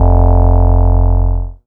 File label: bass F1, EMS VCS3